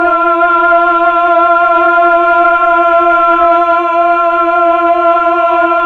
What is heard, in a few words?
Tron Combo, Vox; Roland LCDP09 Keys of the 60s and 70s 1; CMB Melo.Combo